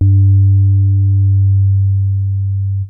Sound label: SUB BAS, BASSES; Kid Nepro; Elite